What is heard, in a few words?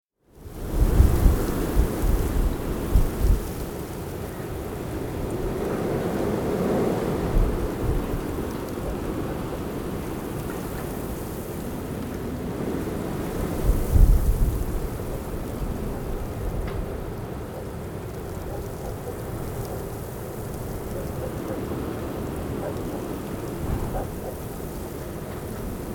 strong wind in town